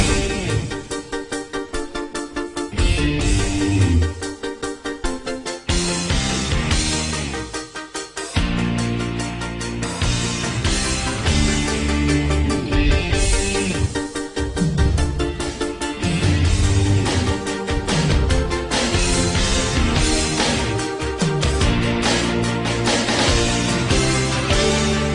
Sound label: Intro Instrumental ringtone; Pokemon